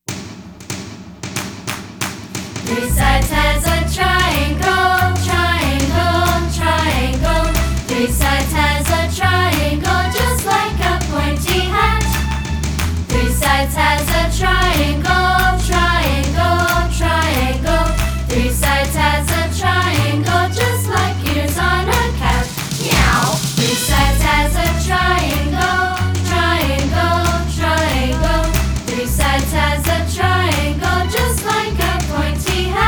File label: triangle